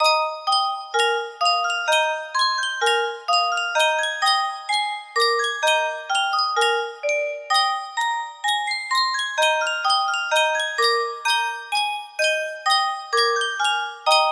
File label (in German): yunsheng spieluhr